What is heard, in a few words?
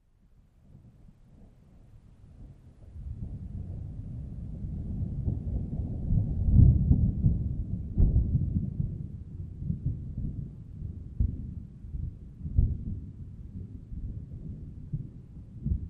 Thunder Receding, distant